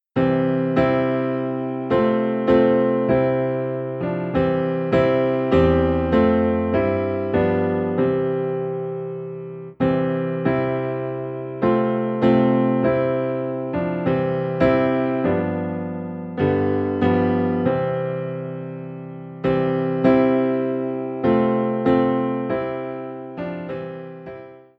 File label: Piano - Low in C; My Jesus I Love Thee